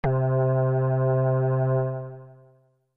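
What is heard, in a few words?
16 sequence pad C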